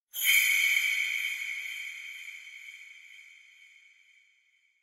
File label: Kagura Suzu